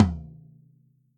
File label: Studio Toms Hit